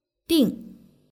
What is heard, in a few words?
ding; dingjiudian